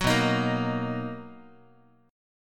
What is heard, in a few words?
B Major 7th, B, down, Guitar; BM7; strummed; 9,7,6,8,x,x; 4A,4E,5A,5D,5G#,6C#